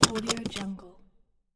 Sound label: car seatbelt unlock